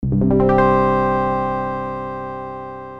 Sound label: Harp strum